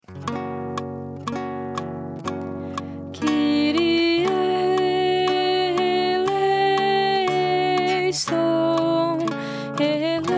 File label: Kyrie Soprano; Kyrie eleison; JMJ